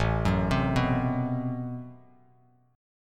A Minor 6th Add 9th A Bass Standard, Chord; Am6add9 arpeggiated; 5,9,10,4; JGuitar

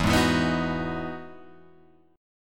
E Major 9th E down Guitar Open G

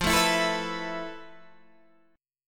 F Major 7th Flat 5th, Guitar, Half Step Down

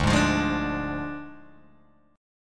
D Diminished Guitar Open G, chord

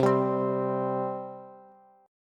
C 5th C down Bass; C5 strummed

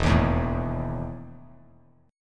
E Major 7th Suspended 4th Bass Standard; EM7sus4 strummed

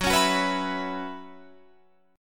Gb 7th Suspended 4th, Guitar Open F; Gb7sus4 strummed; 18,20,18,18,19,18

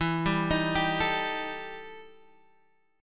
E Minor Major 11th E down Guitar Half Step Down

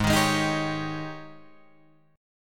G sharp Major 7th Suspended 4th, Guitar, Drop D; 6,6,6,8,8,9

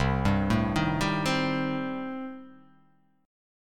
C Minor 6th, chord

